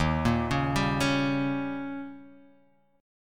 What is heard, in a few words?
D sharp 7th D sharp